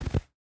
footstep boots snow jump land; Rust

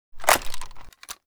wp foley m60 reload raise

